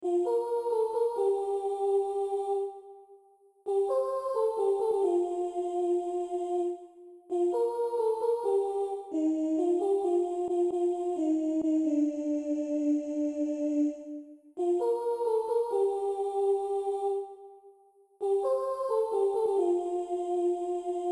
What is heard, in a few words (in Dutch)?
sopraan midi